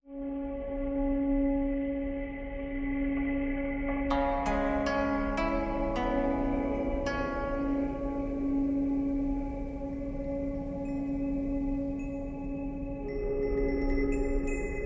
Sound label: No Perc No Strings